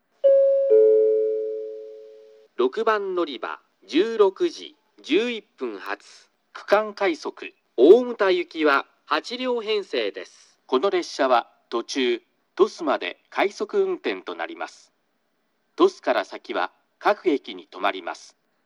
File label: regional rapid